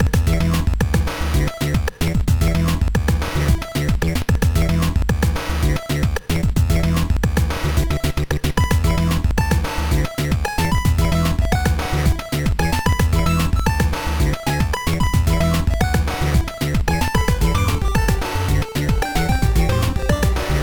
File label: BGM; Karnaaj Rally; gba